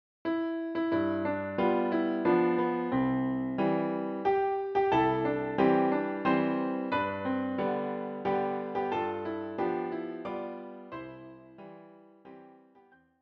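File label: piano four hands; Happy Birthday to you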